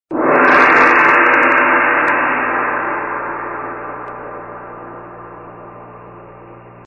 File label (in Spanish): Gong